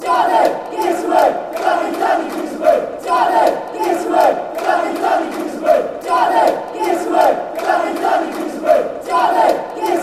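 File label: give us wave, football chants; danny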